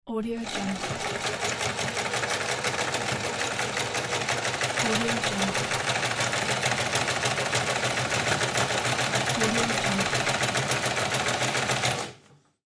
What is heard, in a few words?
sewing machine